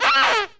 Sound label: squeeze rubberduck